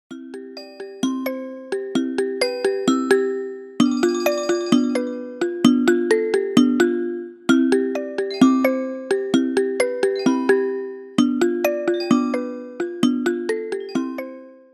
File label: iphone 11 alarm ring